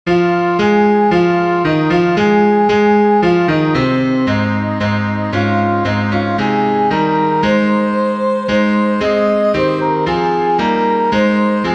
restoration soprano; “Come You Sinners Poor and Needy”

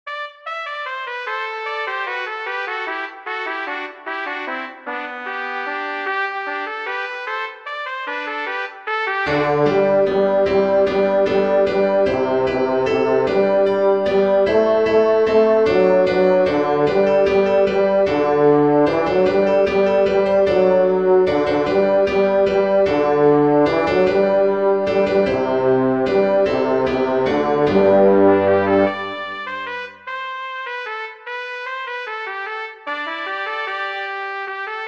wassail bass; Arranged by J. F. Dausch

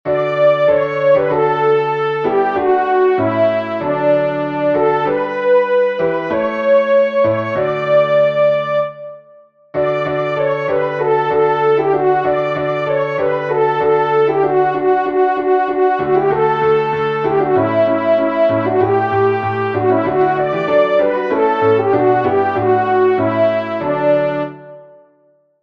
soprano, featured voice is a horn; Verses 1 and 4

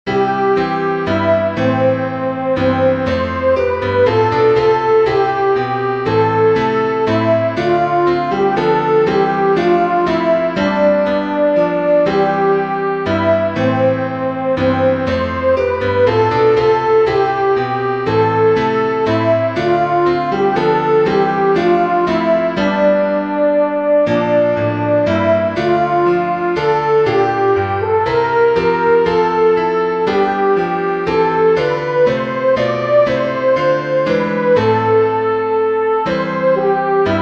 abbots leigh soprano

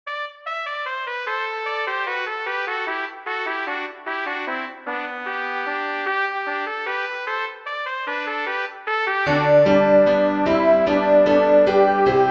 wassail alto; Arranged by J. F. Dausch

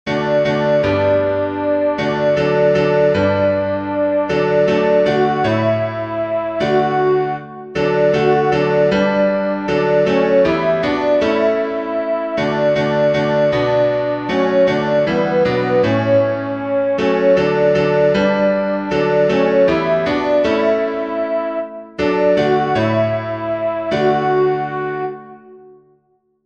victory alto; by Giovanni da Palestrina (1525-1594)

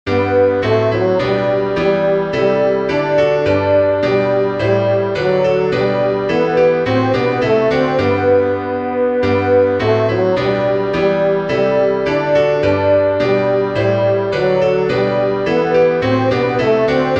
in babilone tenor; There’s a Wideness in God’s Mercy

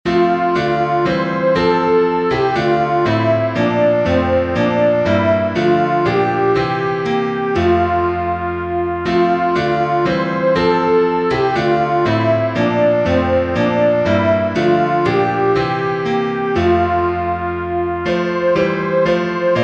praise to the lord sound soprano; lobe den herren; Praise to the Lord the Almighty

